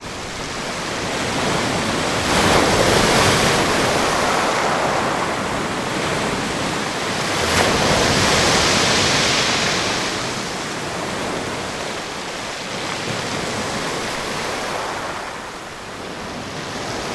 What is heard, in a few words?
water ocean waves break on beach